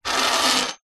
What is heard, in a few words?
household jewelry wedding ring platinum slide table desk; zapsplat